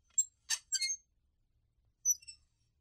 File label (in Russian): fljuger